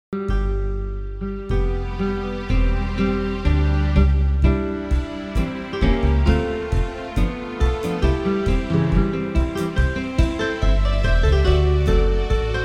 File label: Soft Strings with Guitar